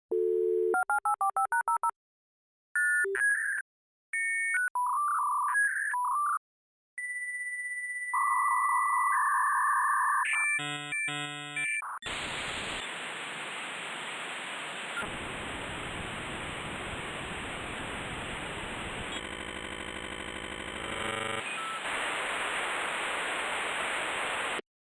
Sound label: Dial Up Connection